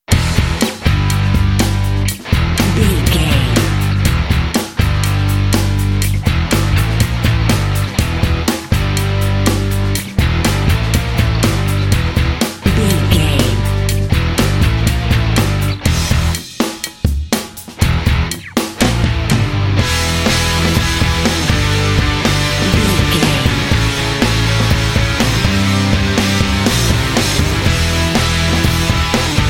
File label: guitar riff